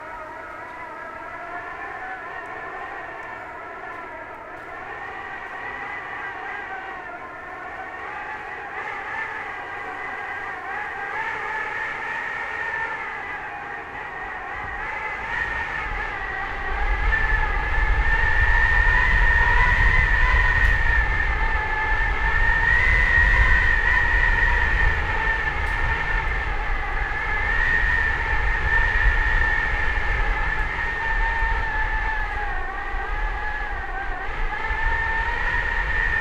Rode NT4 (Studio Outdoor Recording), vor dem Fensterschlitz; Curacao; Fieldrecordings